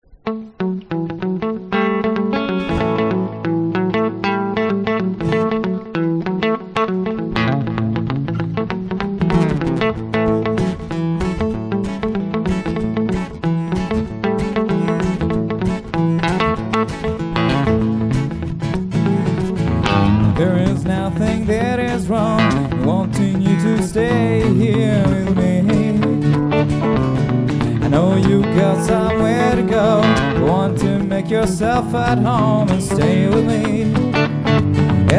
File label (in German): Solo umgekehrt Fade Out; Kachelsetzer